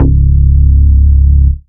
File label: Vengeance Ultimate Bass C#, Root C#